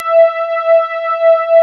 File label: ANALOG E5; East West; Global Sounds; MKS